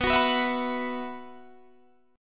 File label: F sharp 7th Suspended 4th, guitar